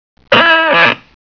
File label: CREAKING, Mechanical; The Complete Web Studio 2.0